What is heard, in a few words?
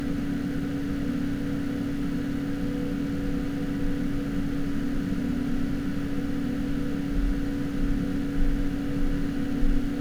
Max RPM